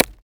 tool hammer impact small; video game; mountain dweller; Bluezone Corporation

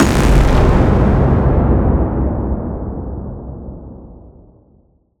black project cinematic impact; scary; Bluezone Corporation

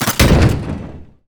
annihilation mech mechanical texture; robot; Bluezone Corporation

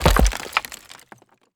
creature wood attack impact dust; Bluezone Corporation

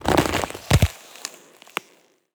ice crack break; snow; Bluezone Corporation

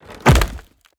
creature wood attack whoosh impact; Bluezone Corporation

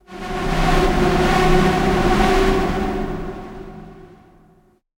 texture synth; scary; Bluezone Corporation

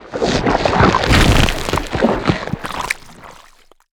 biohazard creature organic texture water; Bluezone Corporation

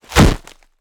creature orc attack whoosh impact; video game; mountain dweller; Bluezone Corporation

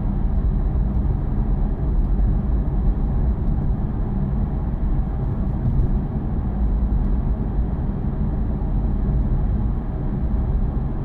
car move, car; simpra

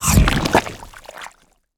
mountain dweller creature orc grunt; Bluezone Corporation; Organic

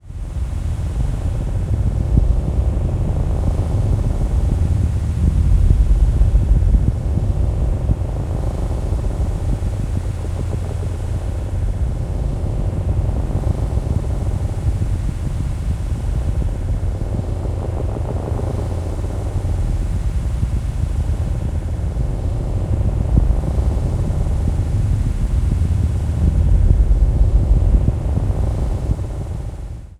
invader atmos scifi ambience; science fiction; Bluezone Corporation

